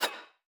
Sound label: damage hits explosions, Warships